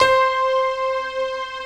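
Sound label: bright electric piano strings; Optical Media International - Sonic Images Library; Mellopia Str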